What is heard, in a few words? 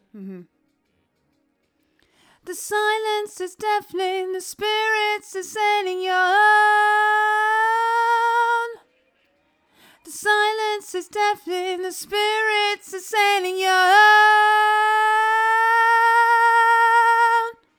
vox